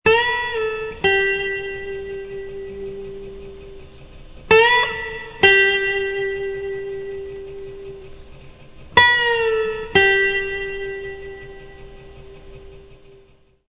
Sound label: types of bends, guitar